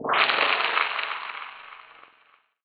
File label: Electric dirty